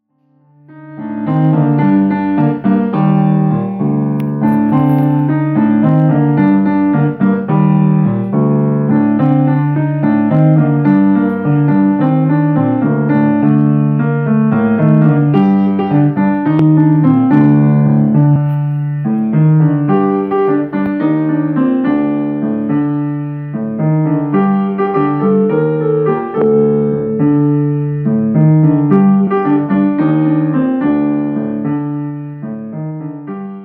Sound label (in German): Klangprobe